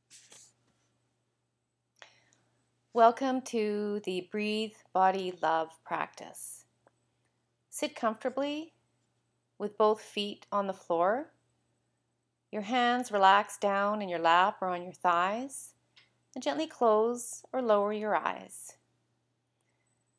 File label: Breathe; Body Love